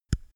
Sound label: microphone pop off soft; objekte; elektronik